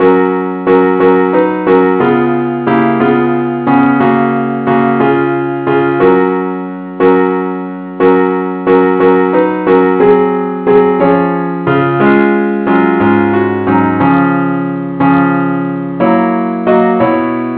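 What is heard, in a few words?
parts only keyboard; key ges; angel voices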